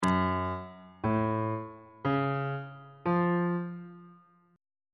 f sharp melodic minor 7th chord 1 is f sharp minor major 7th on bass clef